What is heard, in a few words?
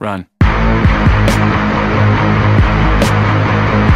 run music, meme music